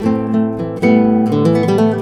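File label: SPANISH A, acoustic guitar 120 BPM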